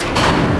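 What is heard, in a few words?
door close metal garage start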